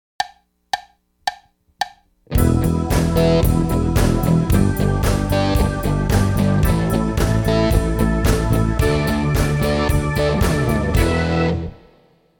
combo, drums

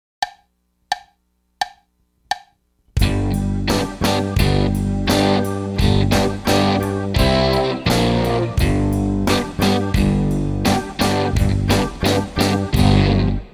full groove eighth ride, drums

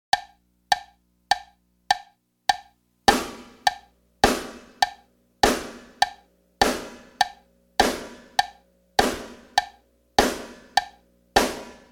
backbeating, drums; straight shot rimshot

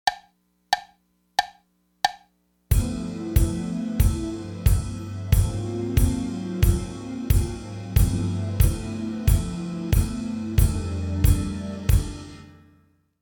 bass ride, drums

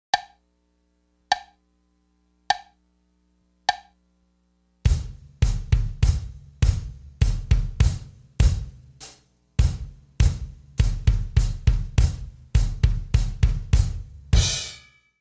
base sixteenths, drums

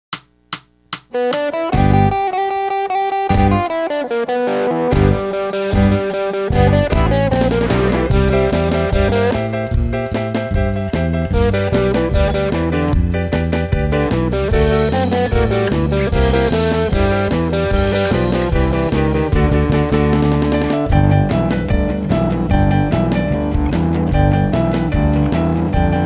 full band; drums

